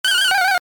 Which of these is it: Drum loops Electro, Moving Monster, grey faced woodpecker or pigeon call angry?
Moving Monster